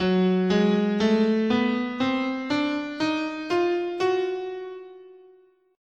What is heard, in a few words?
Gb Diminished Whole Half, scale